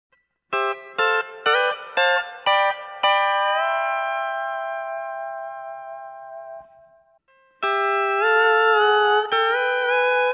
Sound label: 57 Axis